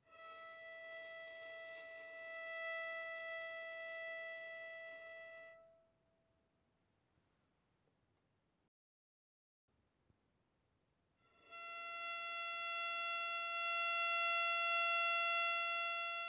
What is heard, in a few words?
Violin arco pp sul E E5 B5